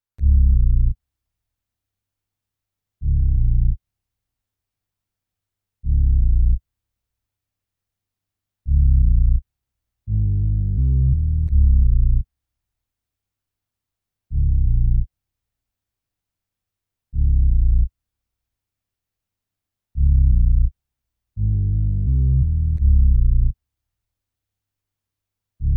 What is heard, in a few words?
85 BPM F Min Bass; Melody; Reverse; Loop Stems; Cymatics; 2022 Melody Collection - Reverse; Winter